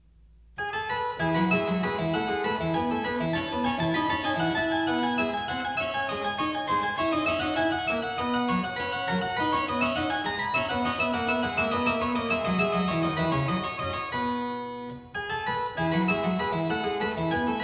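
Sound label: E major, Bourrée; French Suite No.6; BWV 817; BACH; CLAVIER WORKS; FRENCH SUITES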